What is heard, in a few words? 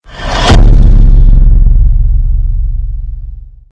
Stinger; STINGERS